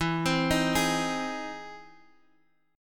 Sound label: E Augmented Major 7th E, guitar